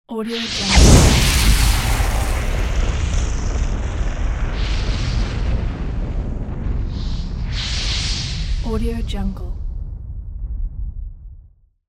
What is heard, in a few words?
fire explosion